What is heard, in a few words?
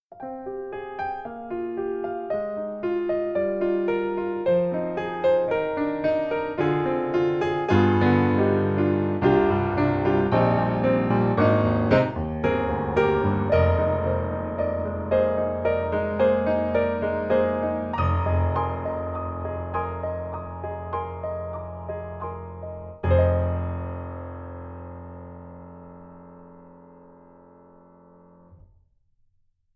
Small AUDITORIUM, piano